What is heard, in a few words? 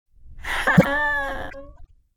sad, spirit; slender; dbd